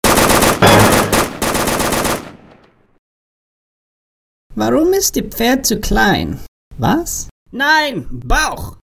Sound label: Acrobatics